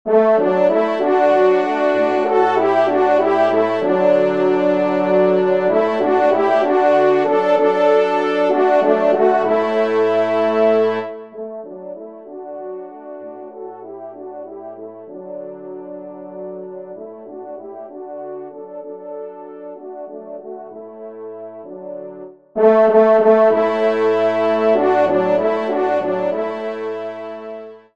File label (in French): Hymne, trompe; Loisirs du Chasseur; Hymne Foret; Henri Sénée